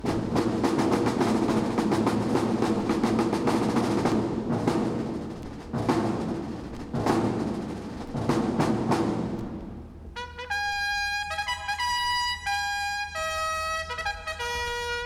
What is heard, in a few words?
Bugle Fanfare; French National Defile; Evatone record